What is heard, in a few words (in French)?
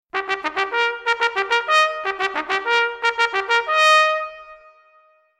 Sonnerie En Colonne Par Quatre